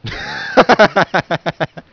laughing man

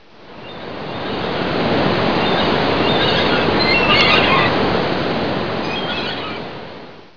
GULLS

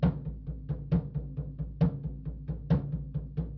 indian drums

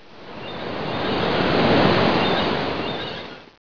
SEA SOUND

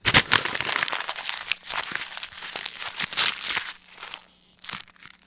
paper crumble

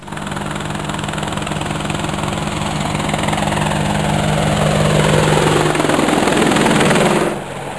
helicopter apache

